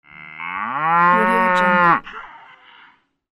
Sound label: cow